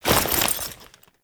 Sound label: Foley Weapon Swap; Warframe; Characters; Kahl